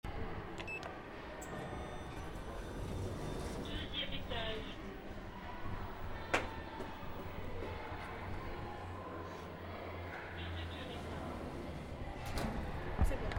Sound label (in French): BRUIT ASCENSEUR; collège H. de Balzac